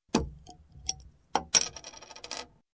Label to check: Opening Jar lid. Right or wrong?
Right